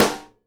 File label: snare GLASS, Live Snares